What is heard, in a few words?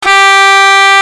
horn, whistle